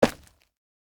Foley Footsteps Rocks Sneaker Jump Land On Two Feet Close